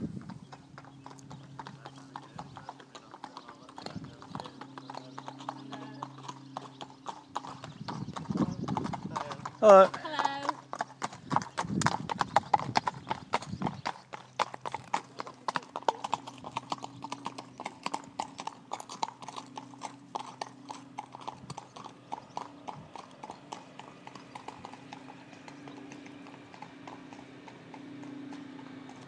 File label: horses; Bugthorpe